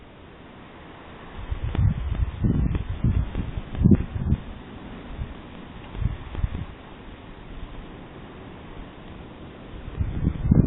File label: security camera digital distortion; distorted wind